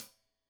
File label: Hat Tight, Hi-Hat, Cymbals; Deeply Sampled Drums